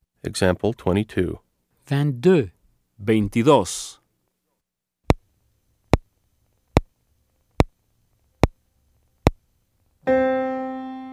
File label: Keyboard